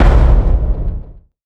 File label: big hit